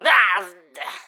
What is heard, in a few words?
Pecheneg Archer Death; Folk Hero